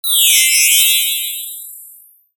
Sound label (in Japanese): chiririririn